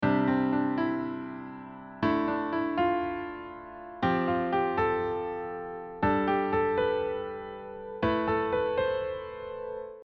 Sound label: sus2 sus4